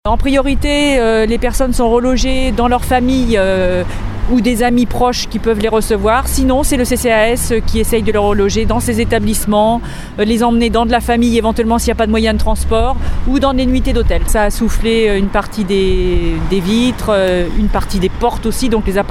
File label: explosion